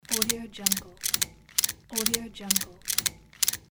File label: ratcheting crank loop